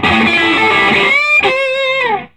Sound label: Vintage Blues Guitar, SOLO